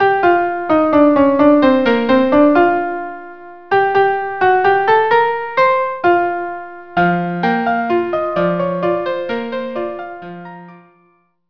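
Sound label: violin viola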